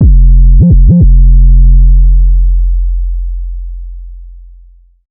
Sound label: Synth oneshot Double Slide F, Synth Bass One Shots; Slate Digital BASSHOLE Sample Pack